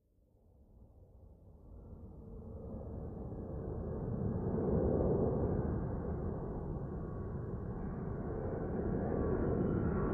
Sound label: Distant Commercial J